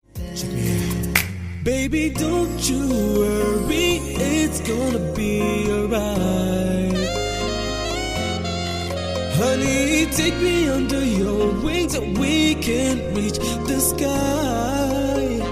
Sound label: arabic baby song